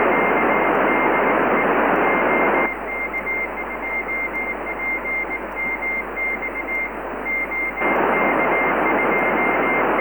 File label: CW; digitale audiofilter